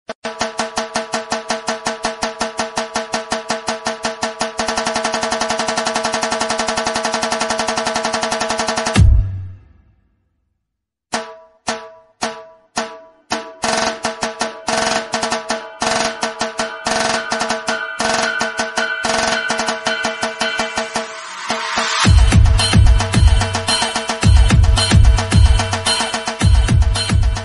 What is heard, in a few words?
nashik dhol ringtone